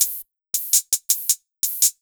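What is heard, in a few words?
110 hi hat loop, Ragga & Dub Reggae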